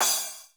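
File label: processed cymbal crash, drums; Crypton - DrumBase5000; PROC.DRUMS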